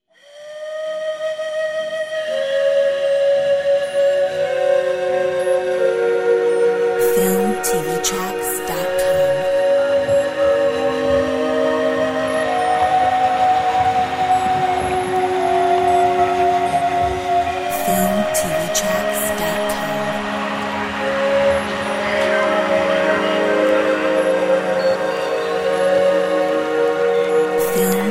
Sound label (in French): La Flute Magique; Filmtv-tracks